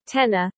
tenor

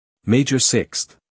major sixth

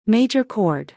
major chord

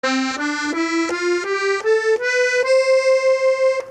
Accordion registers

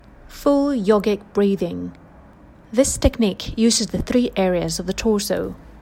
full yogic breathing; This technique uses the three areas of the torso.

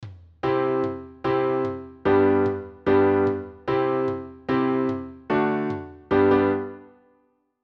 piano

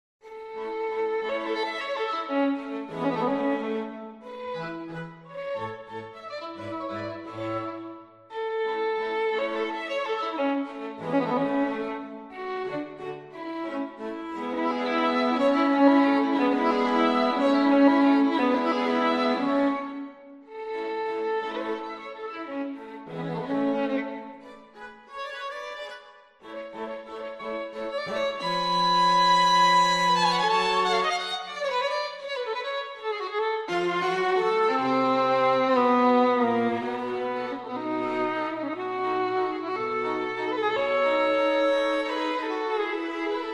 haydn string quartet in a major; hobiii 36 complete

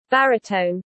baritone

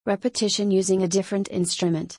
repetition using a different instrument

